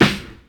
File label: Loop Snare, Ensoniq ASR-X Pro